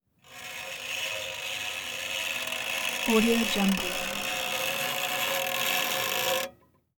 bowed scratchy scrape grating; euk

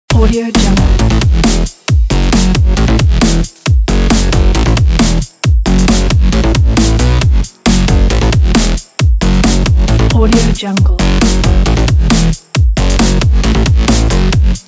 a breaks loops pack

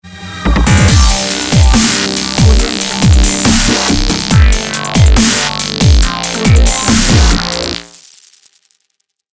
dubstep ident

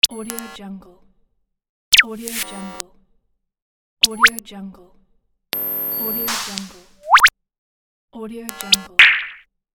set of game feints

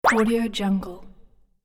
retro game fx

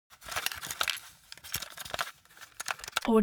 object media cassette rattle long

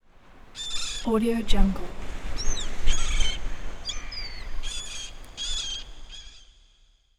seagulls cry

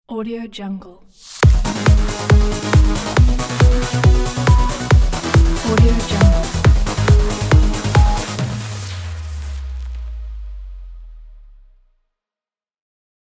start line logo

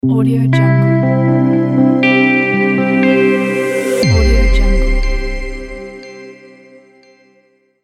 elegant logo

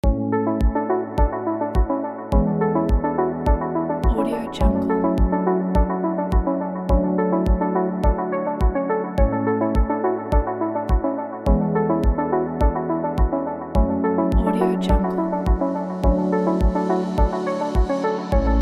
a relaxing

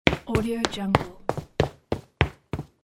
running on stone floor

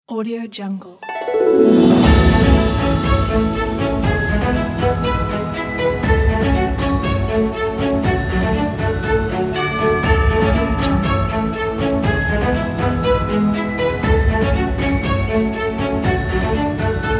christmas bells

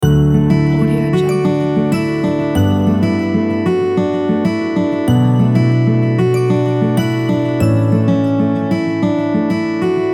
guitar logo

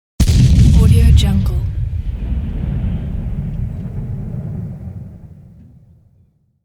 heavy tank shot

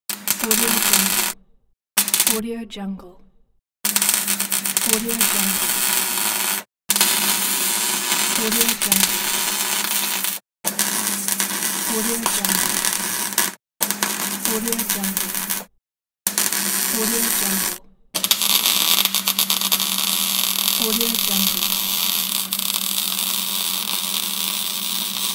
welding pack

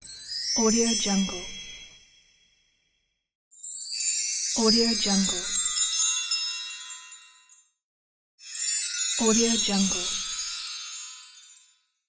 magic sparkle; legatopromusic

